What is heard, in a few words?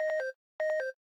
Low Battery In Call; LG Optimus 2X SU660